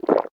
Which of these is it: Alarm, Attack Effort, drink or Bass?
drink